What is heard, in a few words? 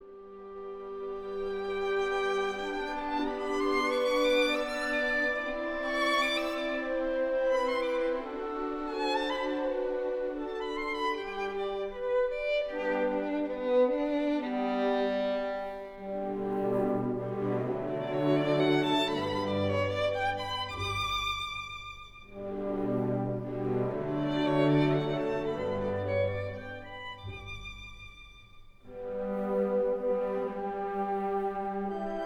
Violin; Beethoven Violin 1; Baltimore Symphony Orchestra; Zinman